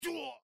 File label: Death; Microsoft Studios; Age of Empires III - Complete Collection; cinematics